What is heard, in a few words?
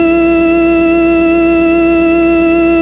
ORG OLD; AMG - Now CD-ROM (Roland); B.2.B. Fun